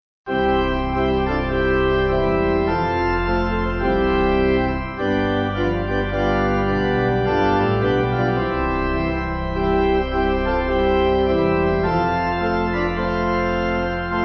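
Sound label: Pipe Organ; Christ Our Redeemer Died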